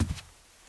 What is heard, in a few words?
carpet step, Footsteps